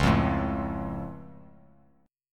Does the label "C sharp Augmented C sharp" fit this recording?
Yes